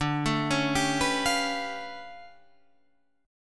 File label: D Minor Major 13th